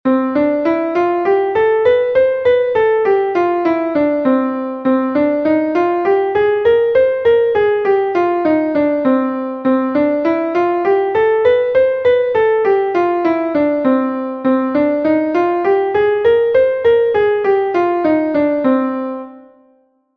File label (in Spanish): escala mayor menor